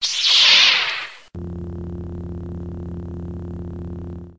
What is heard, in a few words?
Light Saber on; Star Wars